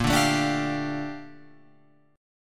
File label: A sharp Major 13th A sharp Guitar Open F; A#M13; strummed; JGuitar